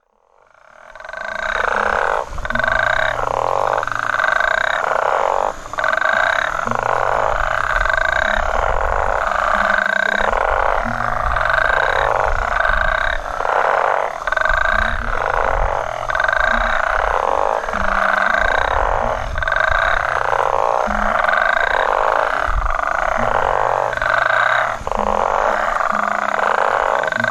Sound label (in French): Vallée de Paul Grenouilles